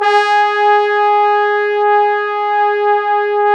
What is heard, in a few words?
BRS FF BONE, Bone Sec.1; Roland LCDP06 Brass Sections